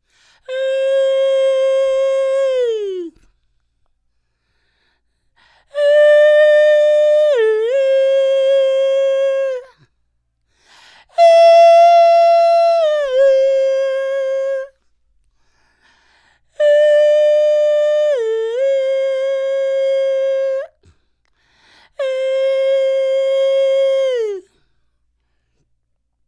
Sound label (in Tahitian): Himene tarava Tahiti, chants polyphoniques maohi; Perepere